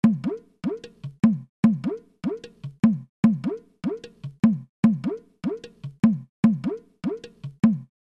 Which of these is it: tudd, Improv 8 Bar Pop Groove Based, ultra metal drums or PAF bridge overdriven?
tudd